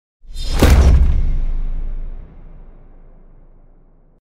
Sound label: impact